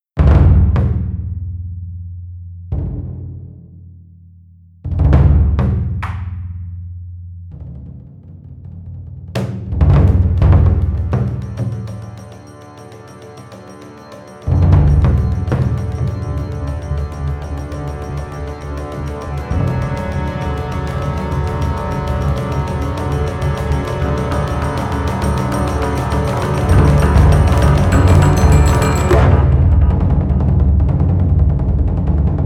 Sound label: taiko overture; fxpansion